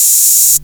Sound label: HH OPEN; Big Beat Samples & More; AKAI; HHOPEN1 KIT